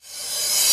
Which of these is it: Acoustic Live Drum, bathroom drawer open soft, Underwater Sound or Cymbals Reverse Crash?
Cymbals Reverse Crash